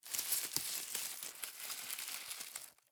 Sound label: leaves, foraging